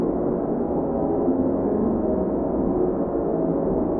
Sample n Hold Verb Loops, sparse soundscape

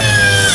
HYDRAULIC; Northstar; The Wizard VOL-1

